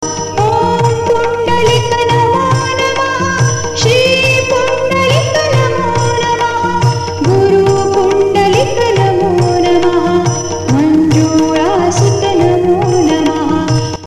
Om Pundlik Namo Nama Shri Pundlik Namo, Ring Tones; Shir Pundlik Baba; Bhakti songs